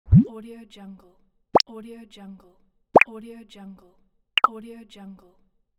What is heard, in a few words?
cartoon select sound